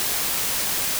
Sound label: gaussian, Stretching Gaussian Noise; Wei-Hsiang Liao; Gaussian Original